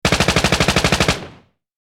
Machine gun burst tank turret

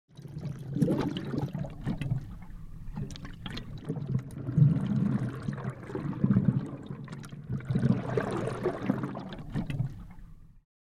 Fish movement underwater perspective

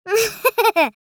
Little girl giggling

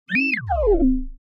Small robot talking style beeping

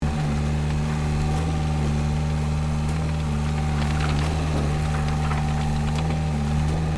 Forklift movement engine noise loopable

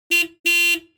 Car double car honk short and medium

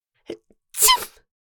Adult female sneezing

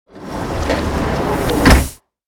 Train door closing; vehicle

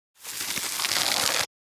Bow draw forest jungle

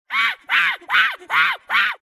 Ape chimpanzee screeching and shouting

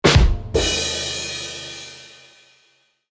Ba dum tss comedy funny punchline; cartoon

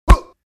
Martial arts punch and grunt male

